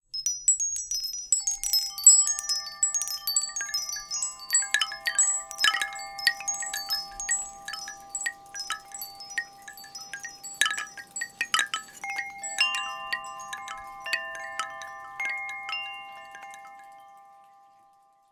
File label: Ring, ringtones; Lumia Ringtones